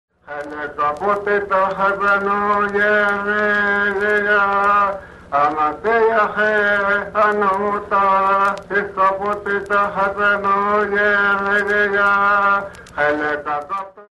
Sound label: Lullaby Seri